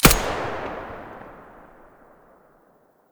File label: muzzle stereo, weapons; fort